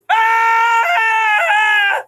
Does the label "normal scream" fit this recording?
Yes